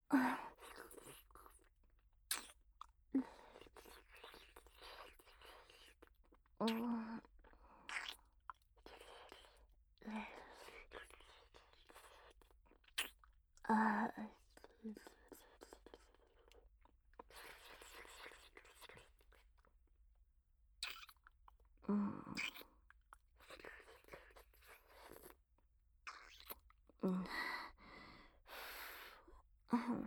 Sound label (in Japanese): 乳首舐め, ASMR